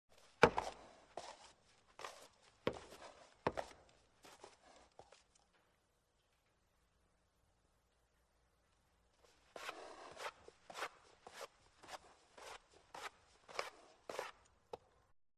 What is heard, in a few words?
FOOTSTEPS PAWING DIRT, wildlife sounds